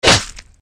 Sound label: attack; csgo; eternity; scp; 966